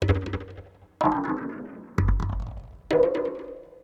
PERC LOOP ETHNO 125; eJay

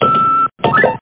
keyboard

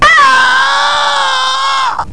scream; PC Gamer 5.6

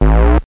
alien appears

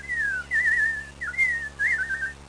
robin; DAoC; Toa; Gamestar

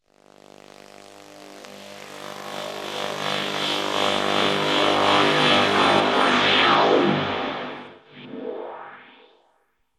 AMBNT 160; Ambient

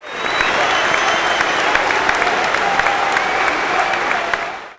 tunnel

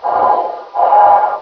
idle, wizard; Quake

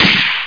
auto

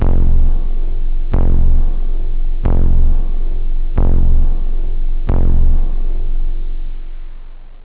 blubb, drums technobd

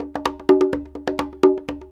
PERC LOOP ETHNO 125

